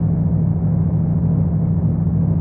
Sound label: METAL; thief